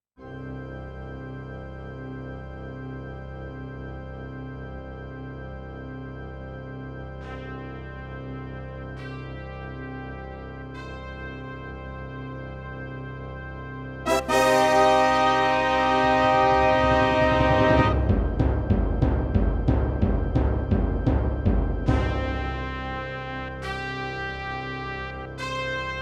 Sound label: SC880